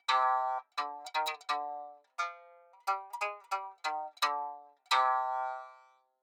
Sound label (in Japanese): 三味線